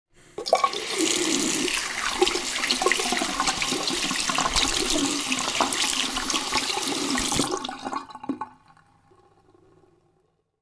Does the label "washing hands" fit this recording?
Yes